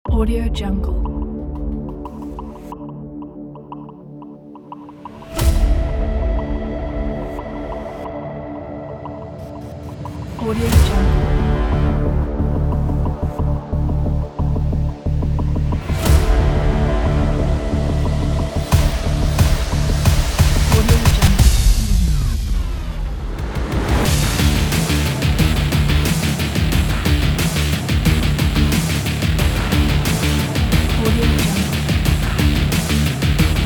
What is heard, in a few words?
action trailer